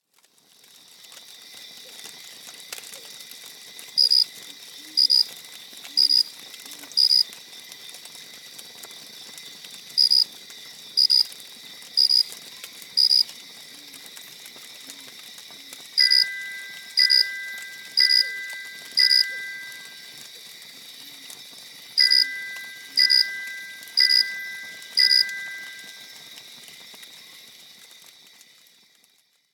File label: Campfire; Google Pixel 4; alarms; Seasonal Celebrations